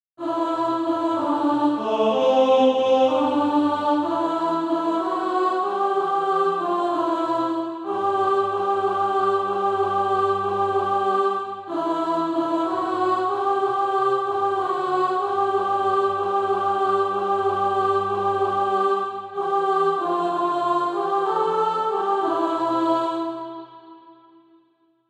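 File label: alto, Practise Music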